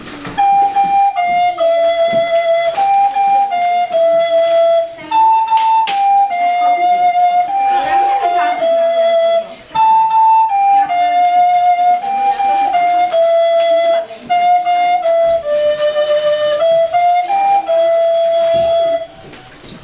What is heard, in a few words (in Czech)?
Fletna